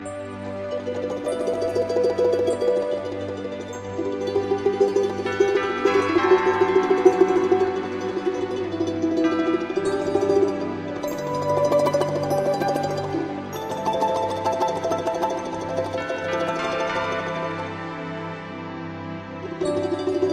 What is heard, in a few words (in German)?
Abendklang (mit Glocken des Kreml)